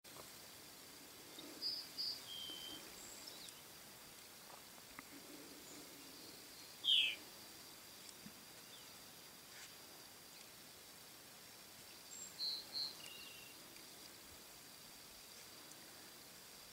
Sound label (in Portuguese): O canto da Noivinha branca